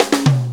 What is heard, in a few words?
4-4 Fill, Drum Loops; Drum Tools; Fills; Sonic Foundry (Sony Creative Software); Loops & Samples Drum Tools